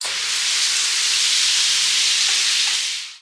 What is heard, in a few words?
Shower; blue archive